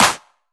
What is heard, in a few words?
Claps; Vengeance Samples